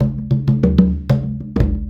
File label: Congas; Essential Percussion